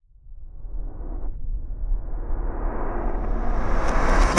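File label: reverse, Cinematic Metal Impacts; Roll Off Into Nothing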